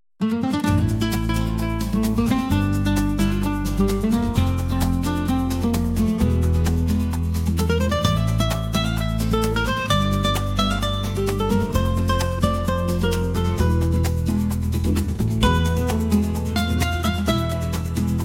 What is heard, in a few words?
passionate flamenco rhythms